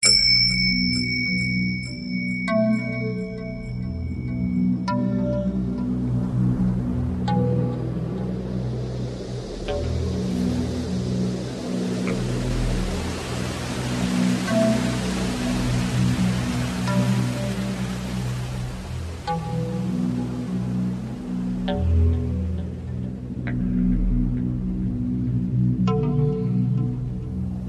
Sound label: zen ocean